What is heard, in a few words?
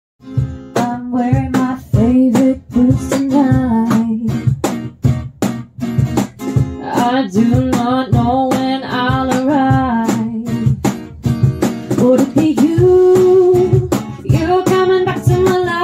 latin jazz